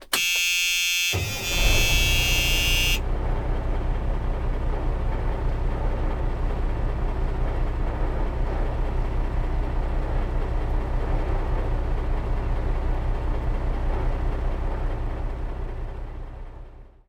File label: Diesel Truck Start